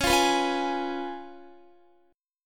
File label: Db 7th Db down Guitar; Db7 strummed; tuning=4F,4A,5C,5G,5C,6E